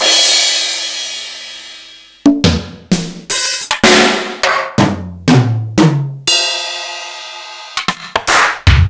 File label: Akai Mpc60